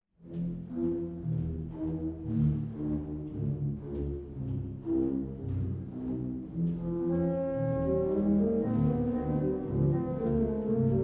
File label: BASSO OSTINATO